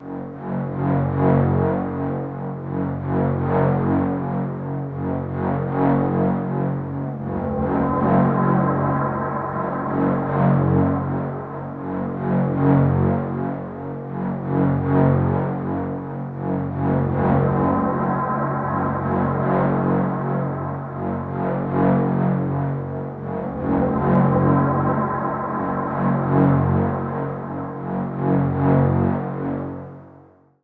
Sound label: Dark Wobbler Pad; Strings & Pads; Zero-G Sound Sense Grime Scene; 90 s Sample CDs